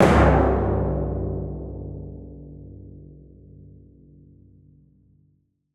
Timpani Hit; Percussion; Soundopolis

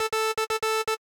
Quotation Mark, Morse Code; InMotionAudio; Morse Code Samples

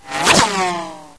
near miss, weapons; hl2

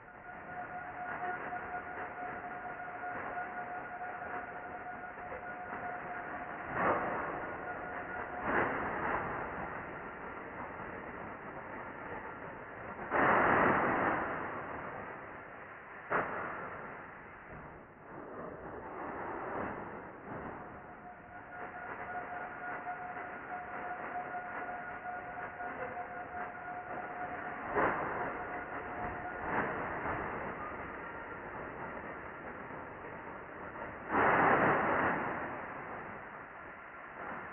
Magnetic Ambience; 344 Audio; Electromagnetic Design

